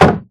block, shield; Minecraft

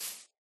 grass, step; Minecraft

